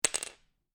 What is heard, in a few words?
Lego Single Lego Piece Drop On Floor; Miscellaneous Toy Sound Effects